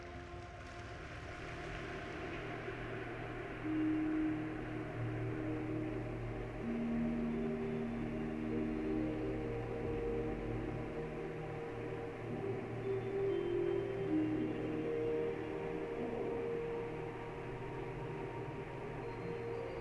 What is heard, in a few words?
Native American Flute